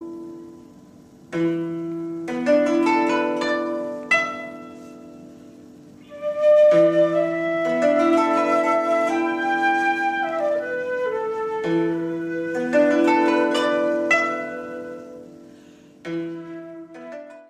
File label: japanese koto music